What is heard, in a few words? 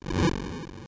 yoshi fire; smw